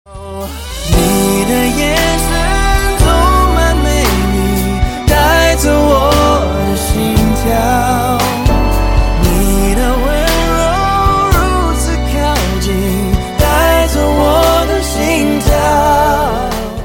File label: chinese pop